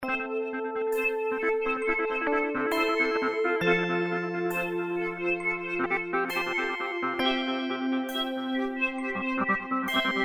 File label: Desert; Project Silhouette